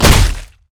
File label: basic attack; LoL; trundle